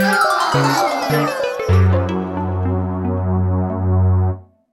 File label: End Of Round Stinger Lose